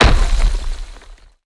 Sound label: Explosion Crunch; Boomerang Fu